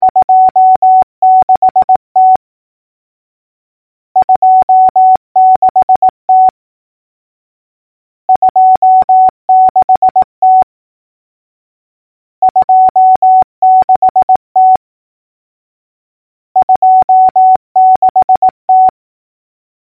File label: morse clicks